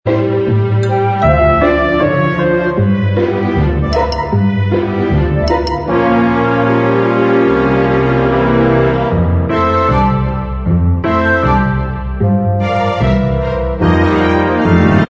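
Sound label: instrumental, backing tracks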